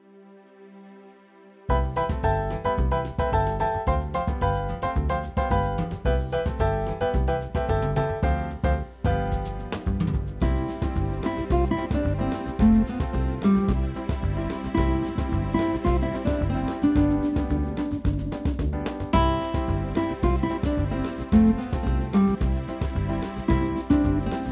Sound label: Combo Samba